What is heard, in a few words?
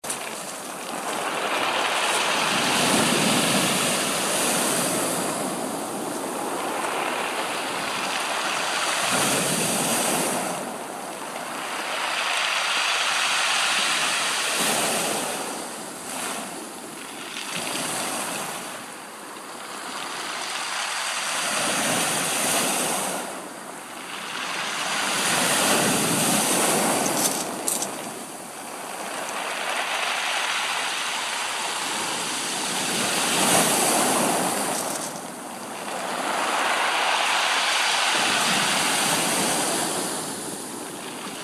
The Sound of Nice Beach